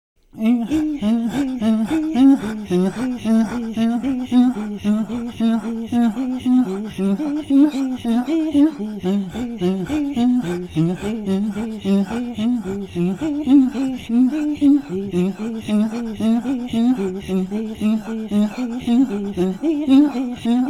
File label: Throat Singing, INUIT; Iha-galak